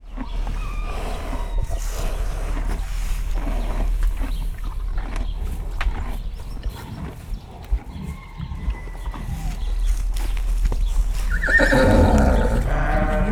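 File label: horse-stealing sheep, stable; parasztudvar; istállo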